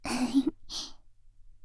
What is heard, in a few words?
laugh, ASMR; Garden; WithRin-Nazo01